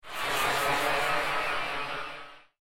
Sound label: Horror Ascent; Evil Sound Effects